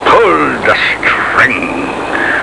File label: STRING